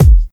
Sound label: prominent bass drum single hit e key; kick drum samples